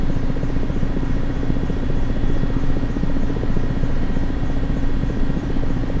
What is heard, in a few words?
Aircrafts Mi-8MTV2 Mi8 cockpit noise; DCS